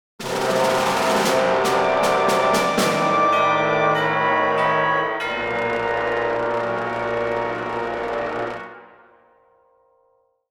Classic Defeat

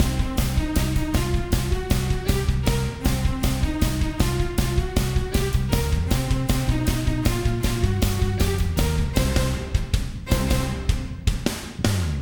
City Platforming MIXED; Ben 10

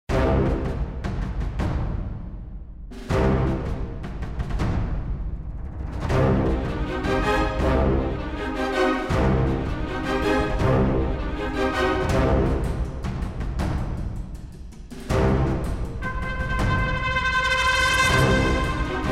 The Battle

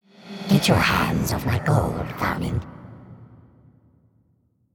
Ghost; Worms Ultimate Mayhem; Ghost Graveyard Ghost

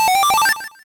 Button-Clear; puchicon; dsi ds

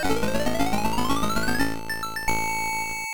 Level Complete; James Pond II Codename Robocod; Master System

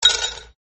blizzard sound; Arc of Templar

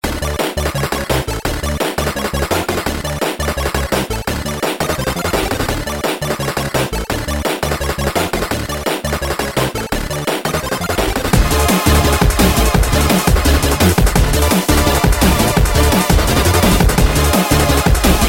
Strife And Bass; The Genesis Project linux macos windows gamerip 2022

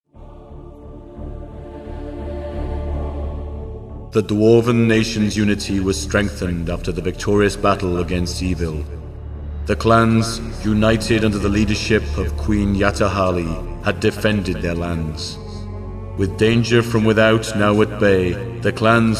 Mountain Clan Win

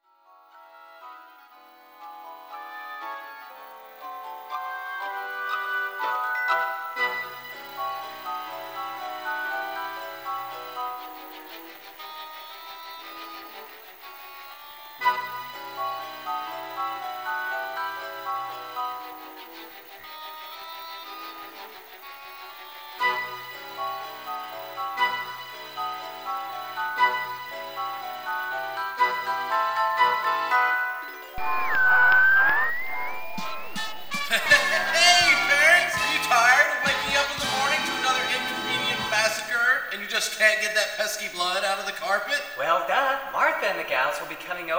SFX Radio

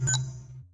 Main Menu Mouse Click; bejeweled 2 deluxe

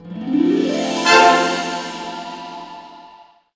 Success Cue; Ratchet & Clank All 4 One

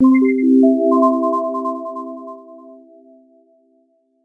Nintendo DSi Sound, Startup; Nintendo DSi System Music

